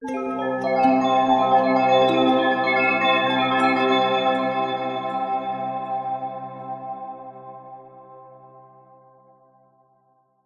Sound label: multimedia musical logo zen calm delayed warm; zapsplat